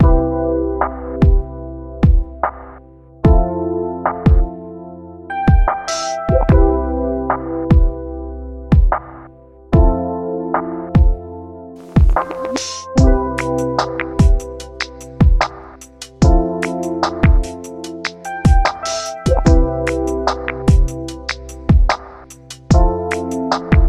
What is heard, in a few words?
sleepy